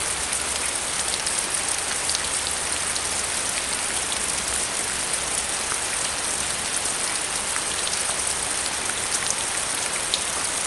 swamp rain

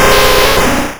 cry; Missingno 0xB5

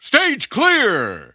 Stage Clear

